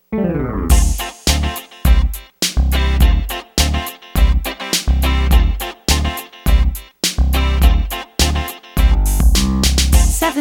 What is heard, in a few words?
Slap Happy